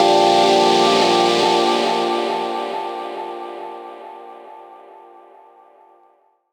PAD SHUSH; Am; Rawcutz - Nu Forms

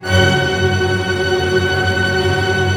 STR TRM F#, STRING TREM

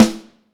Vintage Snare Drum; Q Up Arts; Johnny.C's Vintage Snare Drum; 7.5X14

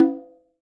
CONGA SOFT, PERCUSSION; Sonic Reality; Interactive Drum Kits

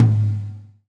GATE TOM, Drums Acoustic; USB Soundscan vol.10; AKAI; 90s sample CD

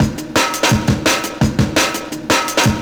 Crakkin Beat, Drum N Bass; Beats; Sonic Foundry; Knutrix Drum & Bass

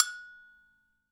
HI AGOGO F, PERCUSSION; Sonic Reality; Interactive Drum Kits

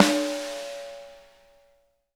SNARES, EDG, Drum Kits

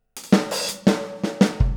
Boogaloo - Full Mix, 82 BPM; Drums; Intro; Sonic Foundry; Drums from the Big Room The Mixes

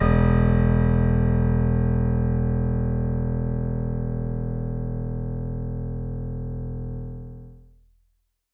MIX MONO, Acoustic Piano; InVision Interactive; Miroslav Vitous

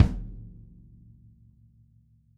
kick

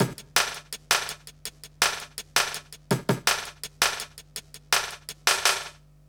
165 BREAK; USB Soundscan vol.05; AKAI; 90s sample CD

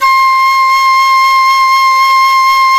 dizi C4; INIS; Opium

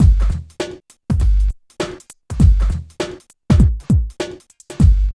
DRUM LP; East West - Phat & Phunky

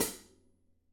Pedal, drums; PX7; Digital Sound Factory Protean Drums

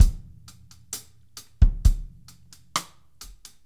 GRV, drum

